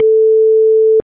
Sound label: no answer